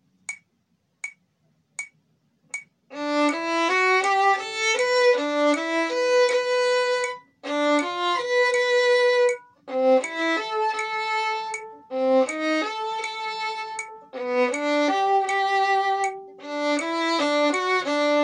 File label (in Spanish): Extracto A lento con metronomo